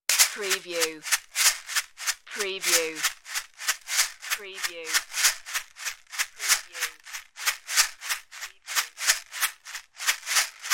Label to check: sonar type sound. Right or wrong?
Wrong